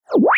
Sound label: Drip